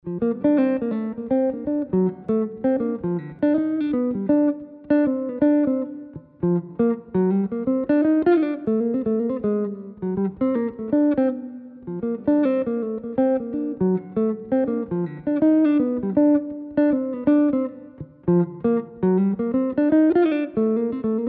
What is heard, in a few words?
melody, jazz tunes